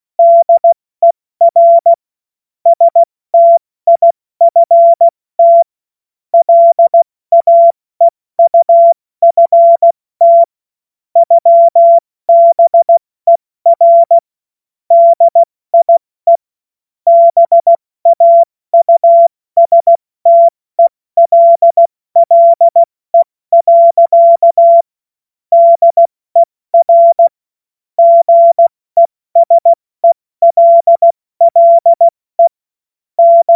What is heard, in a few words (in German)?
Witz, Morsekurs, cw morsen